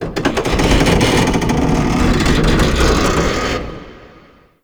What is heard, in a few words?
Metal Creak, Ambience; Warframe; The New War; Zariman